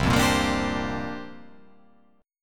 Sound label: C 9th Suspended 4th C down